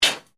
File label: METAL SCRAPE SMALL; Sound Effects:Materials